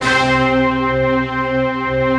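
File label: staccato orchestra, C4; Best Service; Advanced Media Trax Modern Composer; STACCORCH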